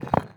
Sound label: building barrier destroy